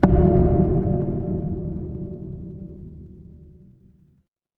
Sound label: instrument wood block